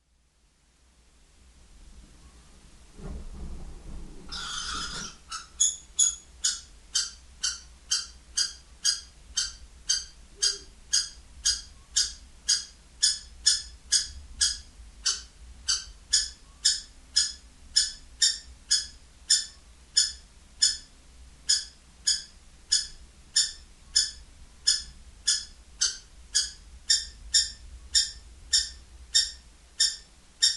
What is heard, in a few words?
Kestrel Sound